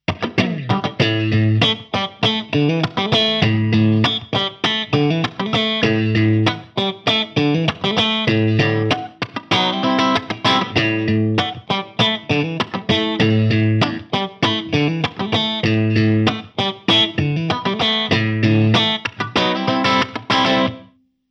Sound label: Soldano SLO 30 Clean